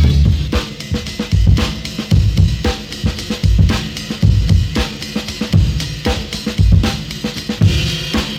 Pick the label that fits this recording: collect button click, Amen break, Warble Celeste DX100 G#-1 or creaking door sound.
Amen break